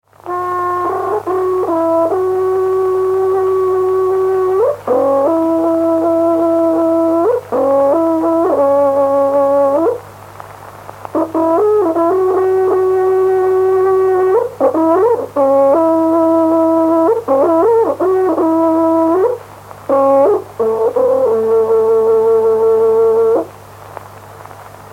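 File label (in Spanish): Toque de trutruka, Mapuche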